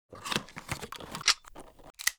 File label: E-11 Reload